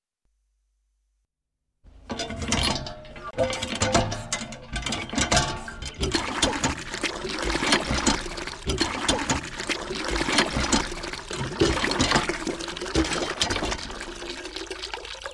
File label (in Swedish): Gårdspump; stockholm